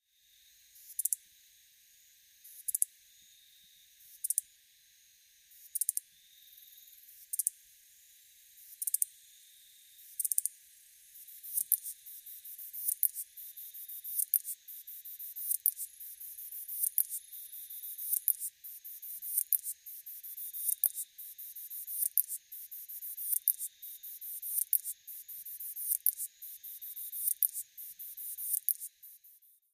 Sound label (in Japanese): ezo tsuyumushi